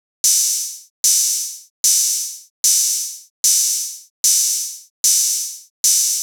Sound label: Dubstep Kit 150bpm Hihats; EDM Drums; Drum Kits; Stems; Ghosthack; Drum Mega Bundle; Ultimate EDM Drums; Dubstep Kit 16